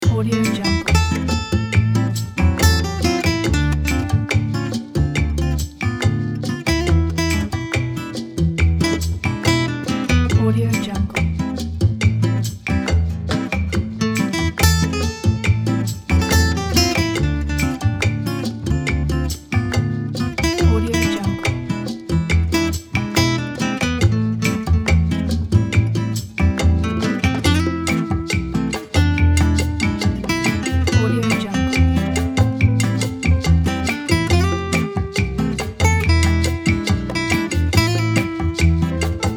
bossa novel

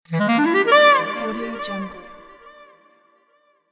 clarinet run

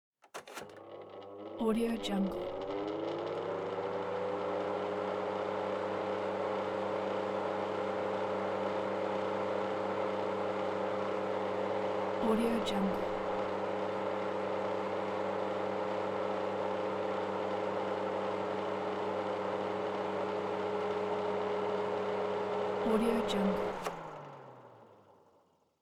electric fan heater

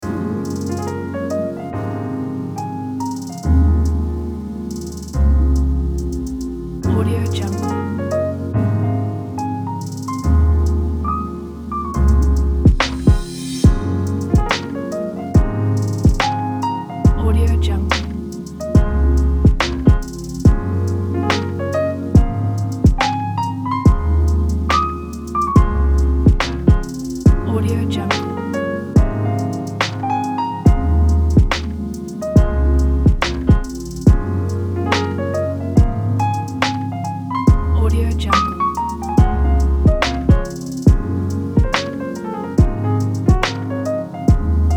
jazzy soul

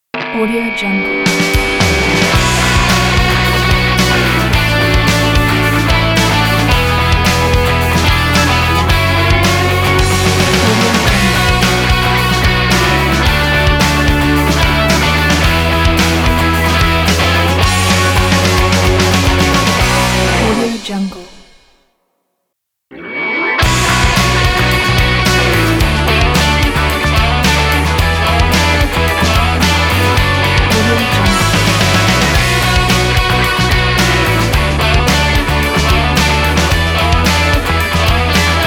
rock logo

